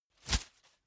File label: whoosh paper scrap flutter